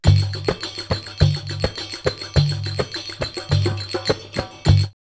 Baghdad 104, drumloops World Ethnic; X-Static Goldmine

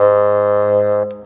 Bassoon, Orchestral, Rock Pop; Sonic Foundry (Sony Creative Software); Orchestral Series VOL-4; Rock and Pop; Rock-Pop 04

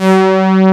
MINIMOOG SOL 1; USB Soundscan vol.49 - Analog Fantasies; AKAI

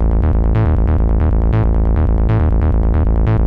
LEGATO BAS, Techno 138 BPM; Best Service ProSamples vol.54; AKAI

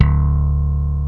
SLAP G2, BASS; East West; Global Sounds

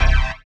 HOUSE, House Dance

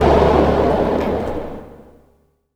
NOISE, Production Effects

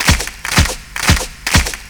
PERCS, 70 s Breakbeats

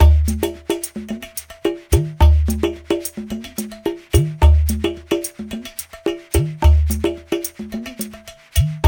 Moose Groove, African Contemporary; Spectrasonics; Supreme Beats

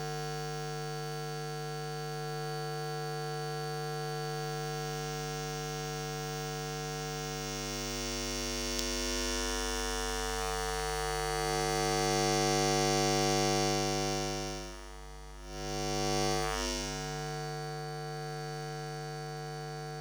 Electromagnetic Field, Electro; electric fields

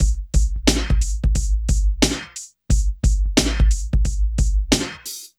drum, Hip Hop and R&B Drumloops

